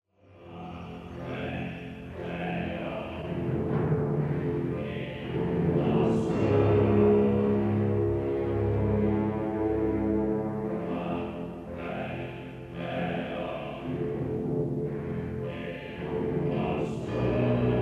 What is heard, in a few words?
Darkness Chant